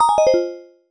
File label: power off